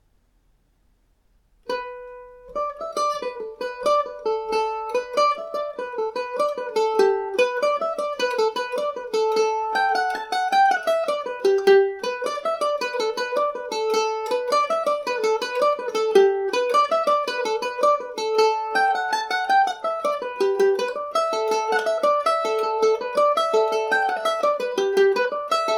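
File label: march, G major, irish mandolin; butchers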